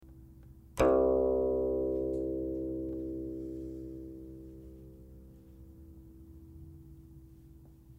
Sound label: guqin; chuYinKouXian